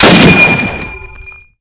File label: garand shoot ping; svencoop; ww2projekt